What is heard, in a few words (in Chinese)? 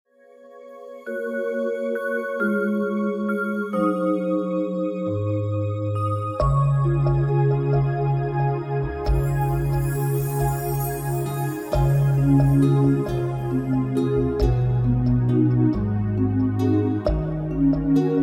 Karaoke, 正向心理兒歌